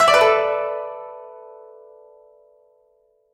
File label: success; Yotsume God Reunion